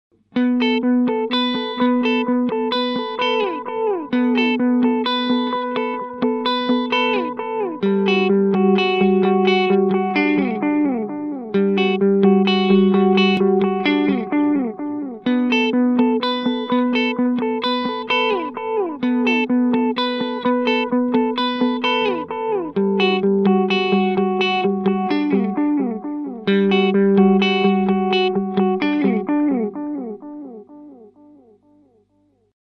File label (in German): Tone Poti auf 12 Uhr; Chase Bliss Audio Tonal Recall RKM